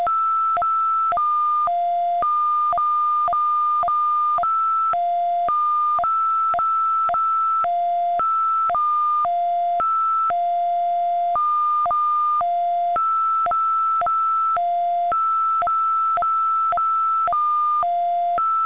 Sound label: DFCW Beacon Example